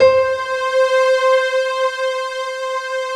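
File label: Piastr, piano strings; Best Service Gigapack 1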